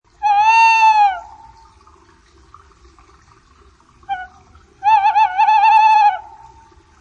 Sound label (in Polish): Ptaki Puszczyk